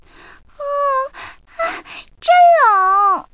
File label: amb